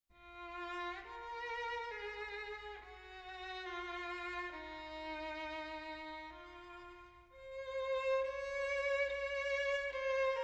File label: Soprano; O Sacred Hands Now Wounded